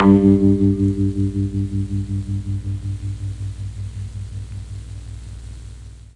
G1 GUITAR, mellotron; MKII

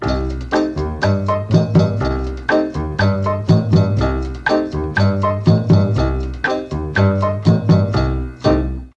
B1 CHA FLT, mellotron

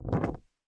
noise muted strum, swag bass

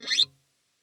scrape, glowly bass

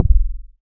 kick tuned e bowed; kicks

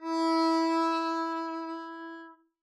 arco e4 mf up, double bass

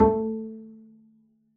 pizz a3, double bass

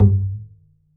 perc, pizz; meatbass

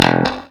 e2 staccato, glowly bass

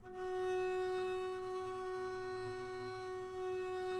gb4 down, arco looped; meatbass

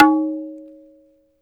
tabla na o; drums; one-shots

